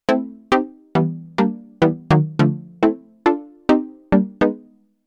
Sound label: housy; novation peak